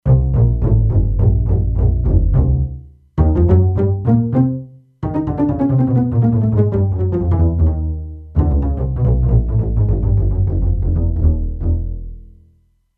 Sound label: Pizz Basses, E-mu Proteus-2; Factory Presets